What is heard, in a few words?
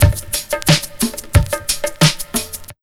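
drums, Pure Electro; USB Soundscan vol.03; AKAI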